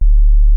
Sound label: SINE WOOF D1, ANALOG BASS; Music Store - Claudius Diemeter Schwerlos